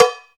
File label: COWB, Cow Bells, Roland